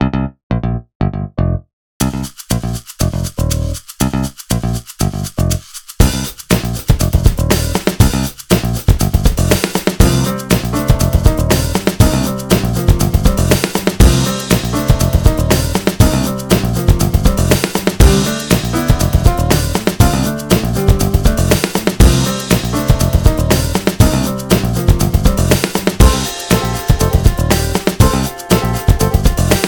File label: Jazz